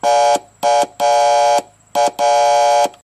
Electrical Air Horn

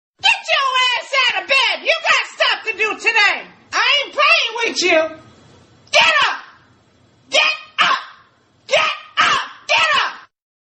alarm

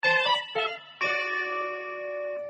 alert; grange hill

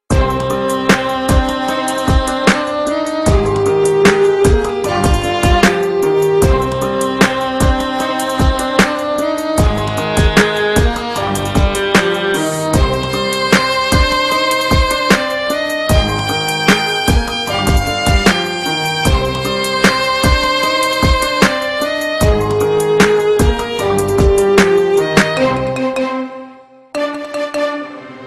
gangsta beat; hip hop

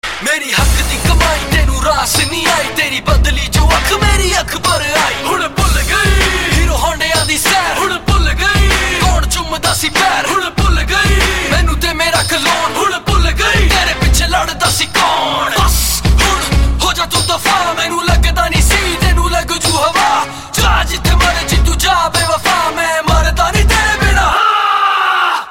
rap, hip hop ringtone

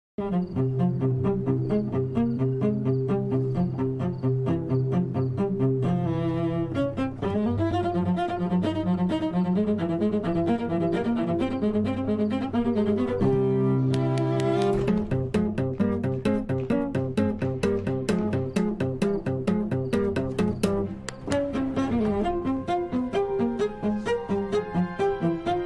plays the cello; wednesday

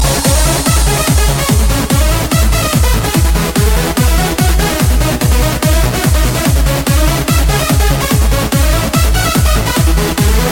Insane Techno

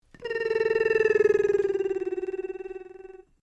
Vroom

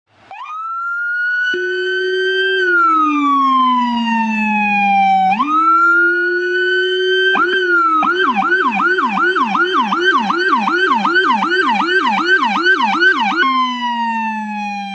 the fire chief shows off the rumbler siren

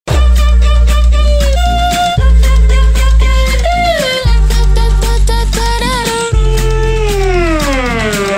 tiny violin

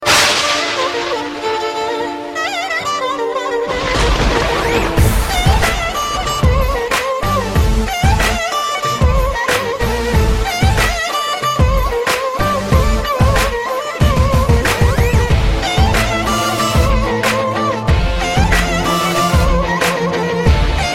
Electronica Bass

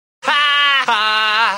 haha; simpson

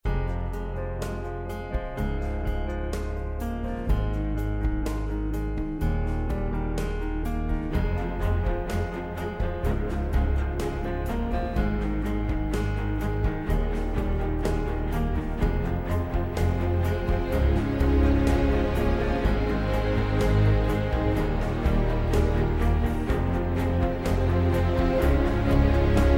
ambient

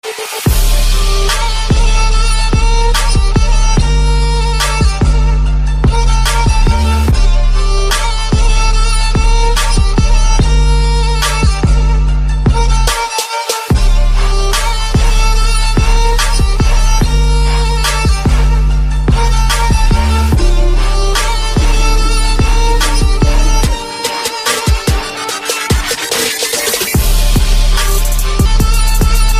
Trap

